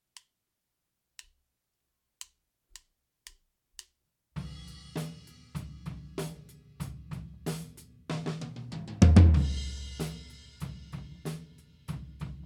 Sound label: No Effect F tom; Drum Recording Setup